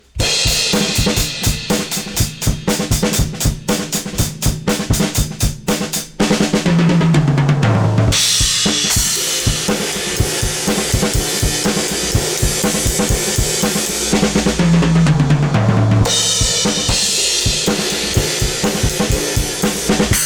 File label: drums Pop; Joe Chiccarelli